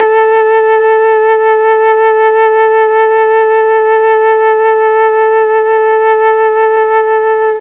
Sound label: A2 mellotron FLUTE